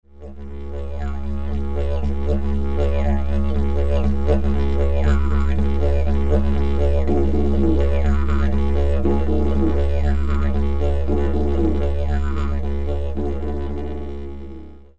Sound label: bamboo, Bambus-Didge, C#; Eigenbau; ebenfalls C#